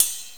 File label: ride cymbal cup; GMPlay; Drums; TG300B